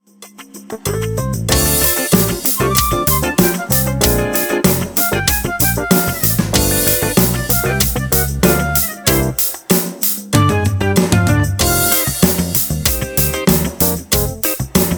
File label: Funk